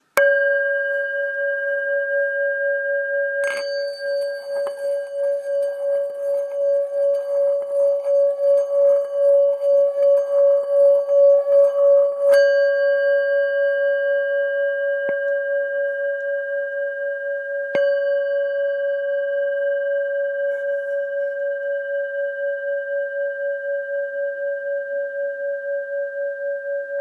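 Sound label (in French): Bol bengale do dièse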